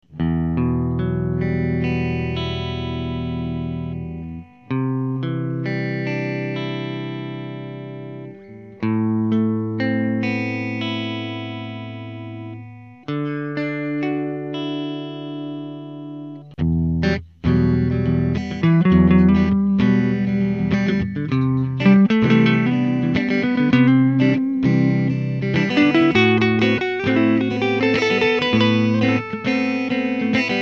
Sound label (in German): add9 jam, gitarren